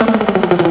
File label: drumroll